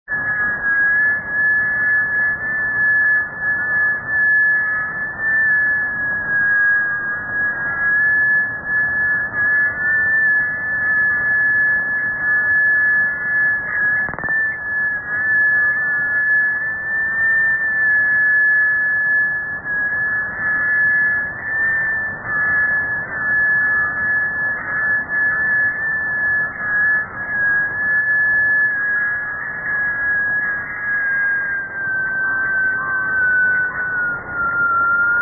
HAARP 9450; Khabarovsk

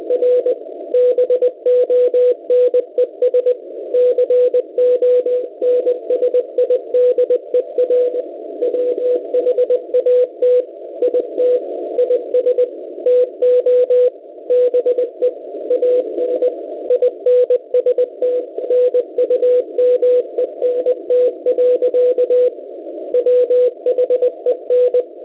9100.00 cw

